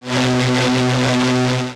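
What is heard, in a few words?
Laser Fire Interior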